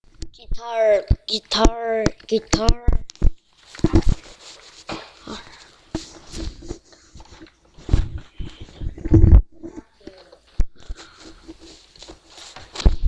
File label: guitar